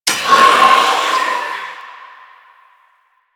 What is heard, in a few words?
Grimoire Vulnerable Impact; Enemies